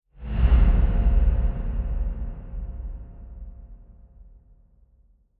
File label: Transition Hit Impact Drone; Production Element